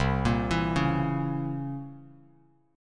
C 7th Flat 9th C down Bass Standard; C7b9 arpeggiated; JGuitar

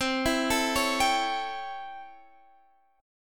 C 6th Flat 9th C; arpeggiated

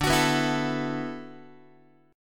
D 6th Add 9th D, Guitar; D6add9 strummed; JGuitar

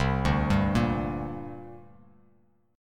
C 7th Suspended 2nd, C, down, Bass Standard; C7sus2 arpeggiated; JGuitar

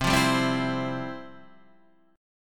F Major 7th Suspended 2nd, C, guitar

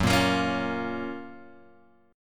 F sharp Major 7th; F#M7 Chord {2 1 X 3 2 1} strummed

